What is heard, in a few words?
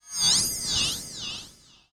ethno 125